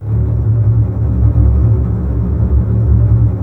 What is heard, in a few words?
D 1, Basses Tremolo, String Ensembles; Miroslav Vitous; 90 s Sample CDs